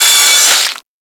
Miter Flesh Impact; Warframe; Tenno; Hit Effects

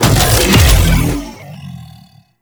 Grendel Deluxe Bowl Start; Warframe

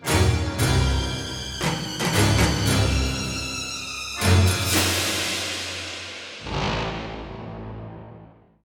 Fishing Success Womp; Warframe; Deimos

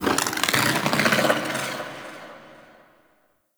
Sentient Lair Exterior Creature; Misc Creatures; Ambience; Warframe

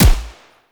Assault Rifle Fire Initial; weapons; Warframe; Grineer

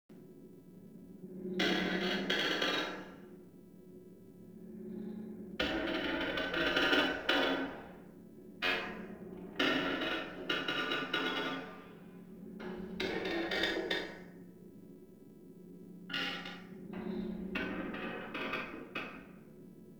Exterior Metal Creak Small Loop; Ambience; Warframe; Duviri

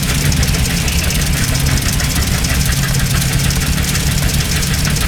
Digger Engine Loop; Ambience; Props; Eidolon Plains; Warframe

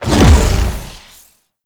Howl Jump; Warframe; Voruna

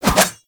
Pole Arm Spin Whoosh

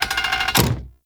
Rolling Drone Turret Turn; Warframe; Grineer

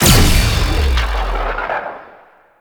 Heavy Orbital Strike Cast; Abilities; Warframe; Tau; Dax Heavy

